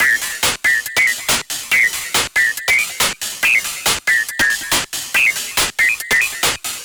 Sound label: Distorted Loop, Drums & Percussion, Electronic